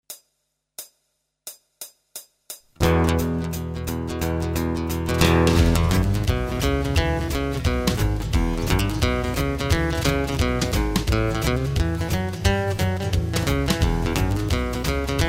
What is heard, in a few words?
Jazz Guitar Boogie; MyVirtualBand